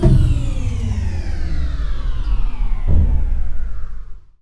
sfx sci fi; Masterbits - Switch FX

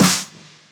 Snares; Vengeance - Essential Clubsounds Vol.4

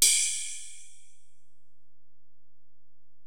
Ride, Alesis DM-PRO, Drum Machines

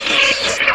VFX, VOX ALIEN

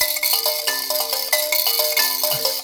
VIB KALIMP, VIB KALIM; Spectrasonics; Heart of Africa 2

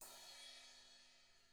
BRUSH CYM, Drums; ILIO; Double Platinum Drums 1

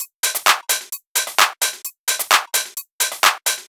Handy Tops, 130 BPM, Percussion; Sonic Foundry (Sony Creative Software); Underground UK House & Electro

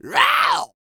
A YELL, MALE HUMAN; R&B & Dance; ILIO; Vocal Planet

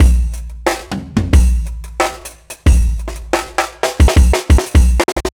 Marching Kit 4-Bar, Breakbeats; KJ Sawka; Drum n Bass & Beyond

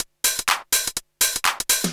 124bpm, House Perc Loops; E Lab; XTc Files of House

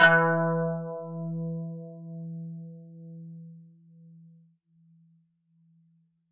KANUN E3; SoundBytes; Early Patches; KAN CHOR BIG

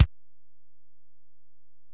Ac BD; Alesis DM-PRO

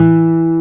G E1, nylon guitar; AKAI S6000 CD-ROM - Volume 1; NYLON GT

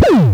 Pwoow, MultiMoog

